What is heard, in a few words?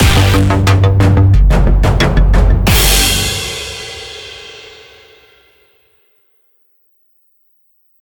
Transition; everspace 2